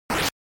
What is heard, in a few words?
Fighting Attack